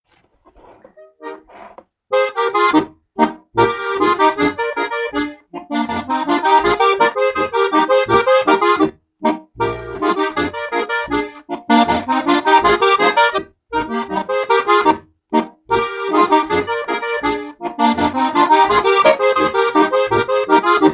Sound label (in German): Boarischer